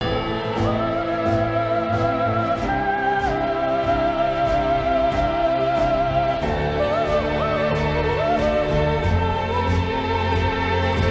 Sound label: inspiring music